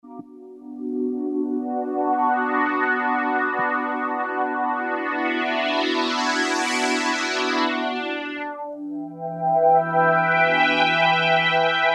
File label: PHASE ST, Kawai SX-240